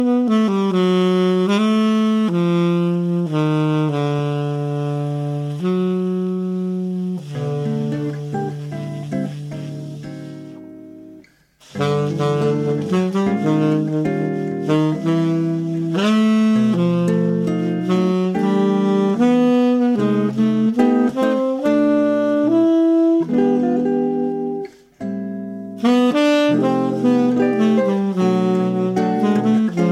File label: Medley Ballads